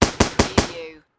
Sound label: Machine Gun M240B